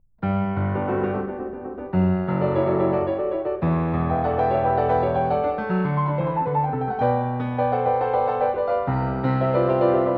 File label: Clav. (Binaural); Bach